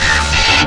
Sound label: 1 Shot Vox C4; Digital Sound Factory Xtreme Lead